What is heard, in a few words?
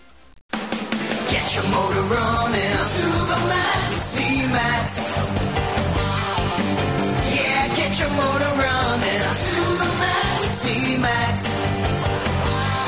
jingles